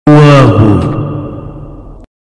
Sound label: bass; uabo